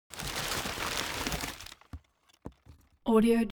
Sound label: paper stuffing paper take out; foley; smartsoundfx